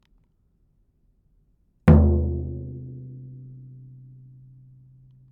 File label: Drum